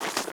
quest folder keeper slide off halloween

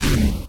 range projectile launch; proj release; the monster plane; kolmods

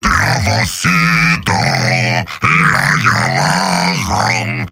heavy singing; mvm

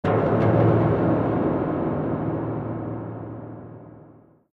spacebase bang; ambient; Garry's Mod; tf2

